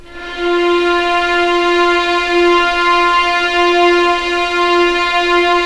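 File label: F4 legato mf violins, right; East West; The Ultimate String Collection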